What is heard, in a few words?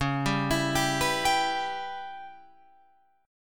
Db Minor 7th Flat 5th Db down Guitar Open F; arpeggiated; JGuitar